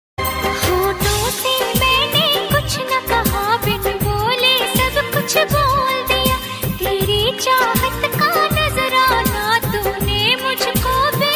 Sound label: Old Hindi Song Ringtone